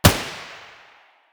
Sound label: p90 muzzle mono distant, weapons